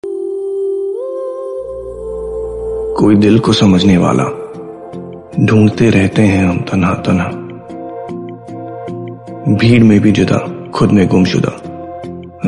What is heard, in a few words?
heart touching bgm